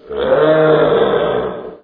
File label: snork idle